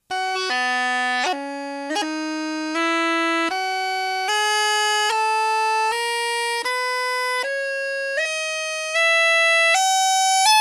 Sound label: B Pitch Scale